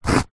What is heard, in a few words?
paper tissue cutting with serated knife single slice; foley